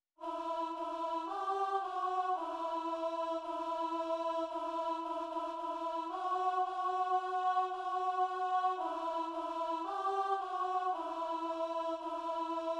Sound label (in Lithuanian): ALTO Šventas ŠV AUGUSTINO MIŠiOS